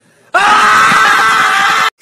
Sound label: aaaaaaaaaaaah meme